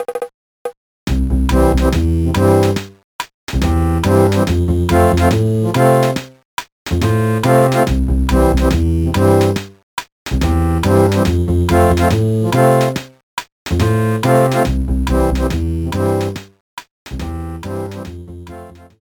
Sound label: World 5 Map (ground); SMA4